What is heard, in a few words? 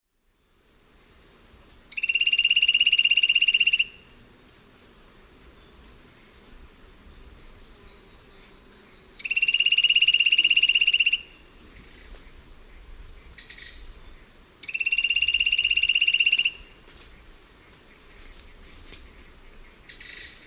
Ramphocaenus melanurus, song; S. America; Eastern Lowlands